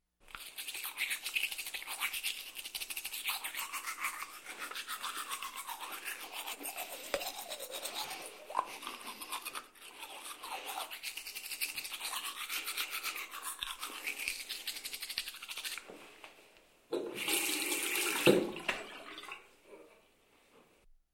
Toothbrushing sequence

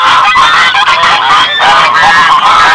Loud geese

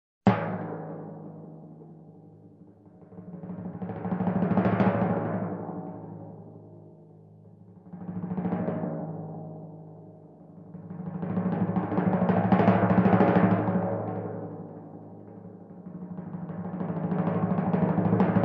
Multiple Timpani crescendos variant

